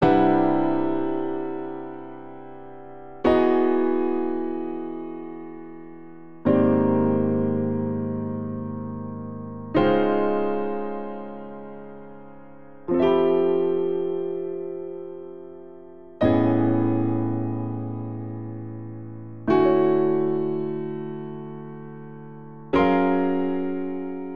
Progression Parallel 3rds Maj#11 74 bpm, Grand Piano; MIDI Clips; Ableton; Factory Packs